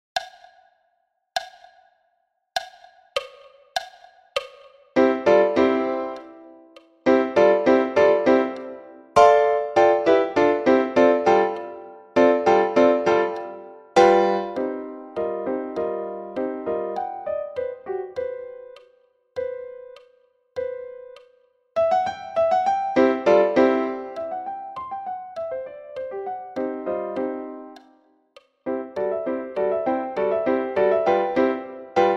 Piano a 100 bpm

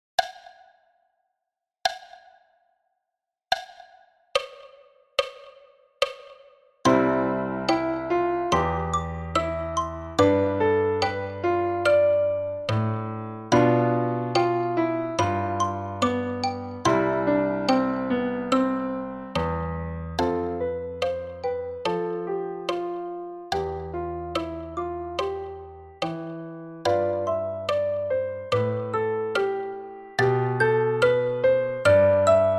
xylo et piano a 72 bpm

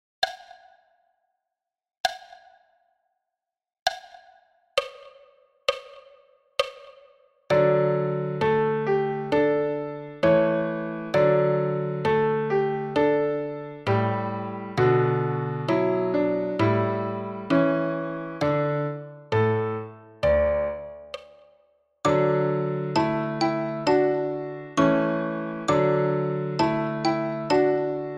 xylo et piano a 66 bpm

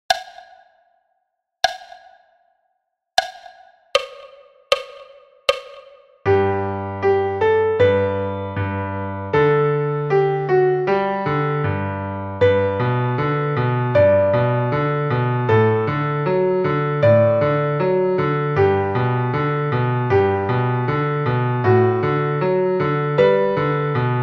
piano a 78 bpm

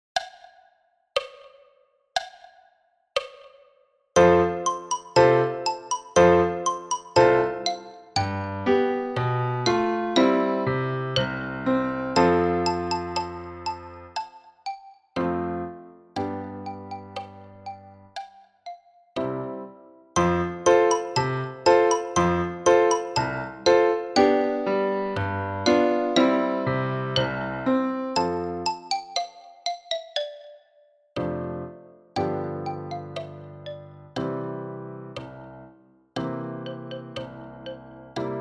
xylo et piano a 60 bpm

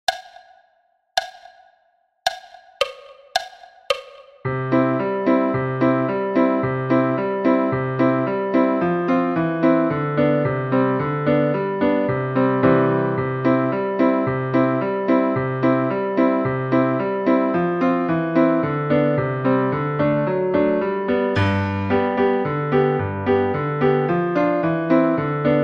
piano solo a 110 bpm

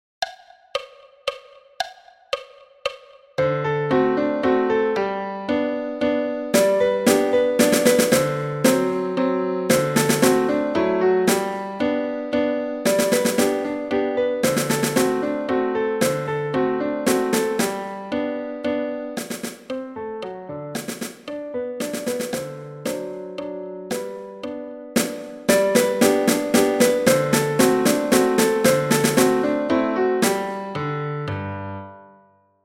Danse autrichienne tutti a 114 bpm

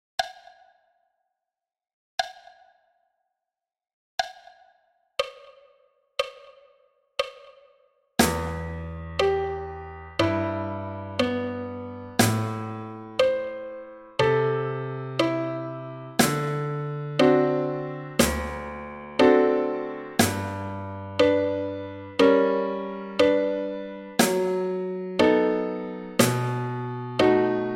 caisse claire et piano a 60 bpm

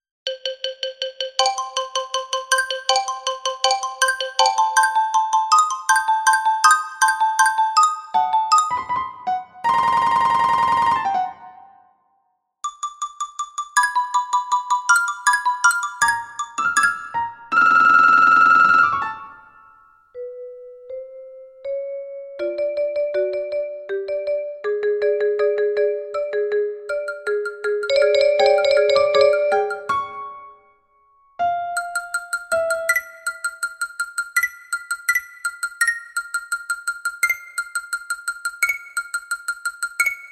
Poules et coqs

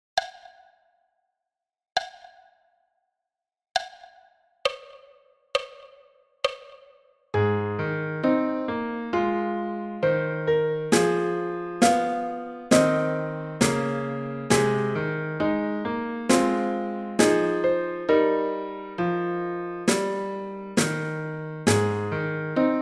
piano et caisse a 67 bpm

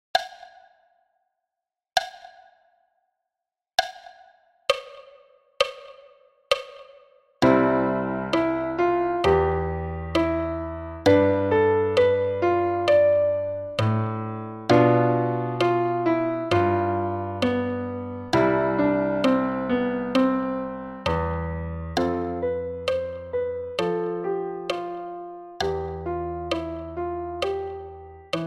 piano a 66 bpm

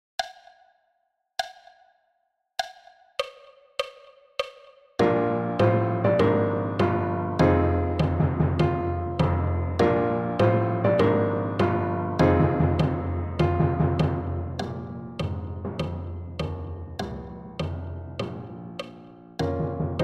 piano et timbales a 100 bpm

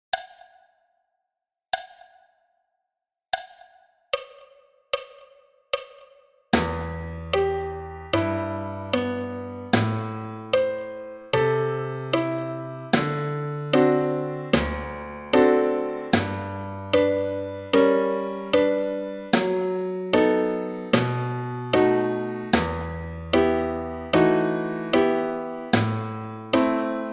caisse et piano a 75 bpm

